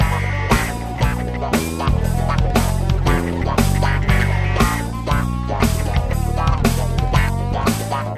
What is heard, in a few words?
funky blob, grooves